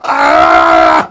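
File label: heavy pain critical death; voice packs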